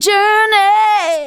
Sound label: vocals sung; Techno Trance Essentials